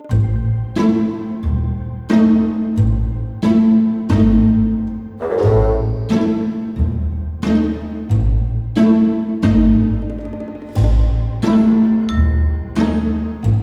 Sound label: Sneaking Loop; super crazy rhythm castle